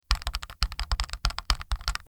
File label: Typing on keyboard